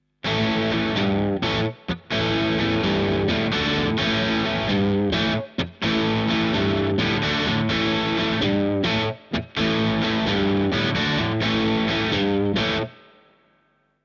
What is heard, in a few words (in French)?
sat sans rien chevalet midshift; bogner alchemist